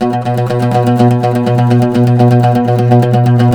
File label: PLK OUD, Roland LA Composer